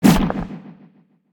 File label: uppercut